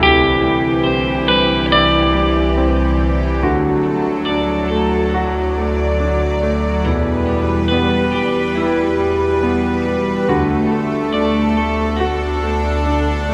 dramatic guitar; by burak sound bank; dramatic dram vol 14

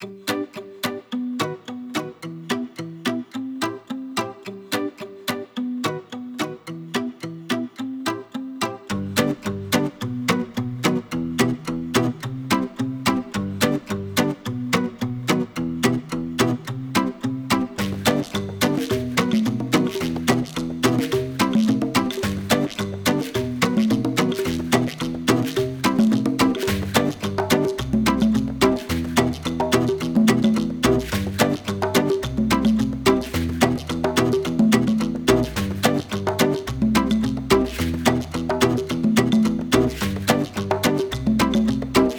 cheerful ritim box; by burak sound bank; cheerful vol 22

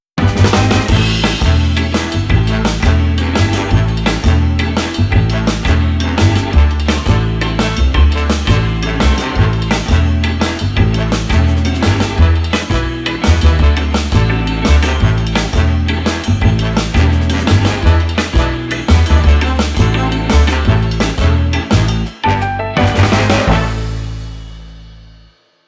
cheerful entertainment no guitar; by burak sound bank; cheerful vol 8

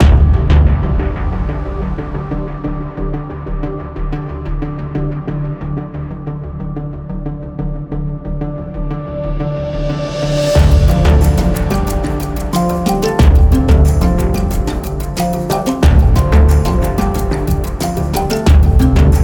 tension; By Burak Sound Bank; tension gerilim vol 19

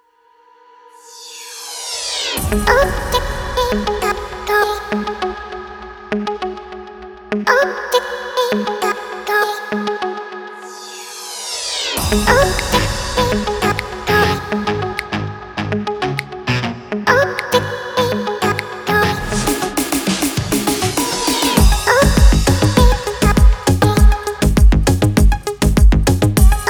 deephouse; by burak sound bank; deep house vol 2

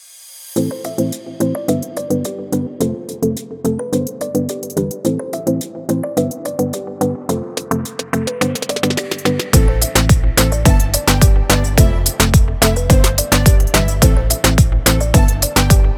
reggaeton; By Burak Sound Bank; reggaeton tema peketleri vol 1

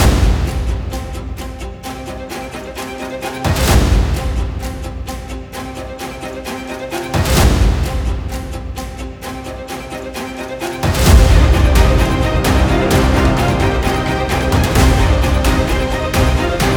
tension; By Burak Sound Bank; horror excitement thriller song

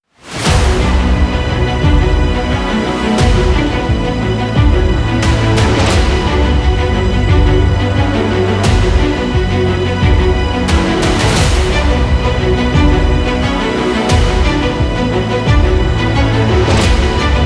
tension; By Burak Sound Bank; tension gerilim vol 22

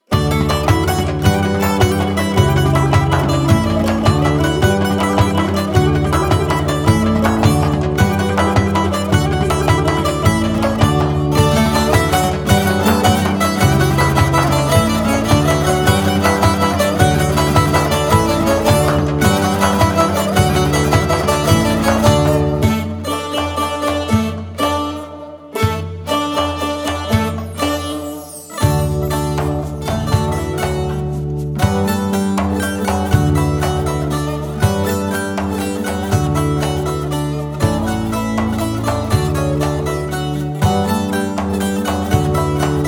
turkish azeri playback; by burak sound bank; anadoludan selam var vol 4; anonimler

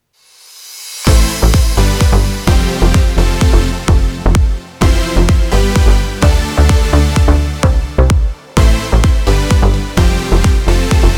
house electronic techno; By Burak Sound Bank; house electronic techno vol 4

